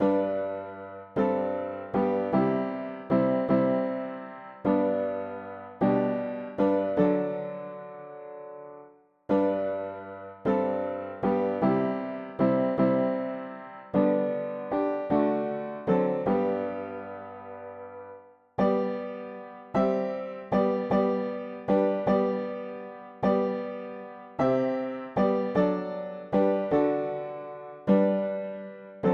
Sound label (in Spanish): mono piano; hymns; Himnario pequeno